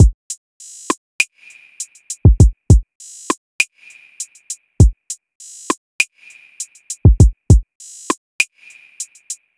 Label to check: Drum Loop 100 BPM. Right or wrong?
Right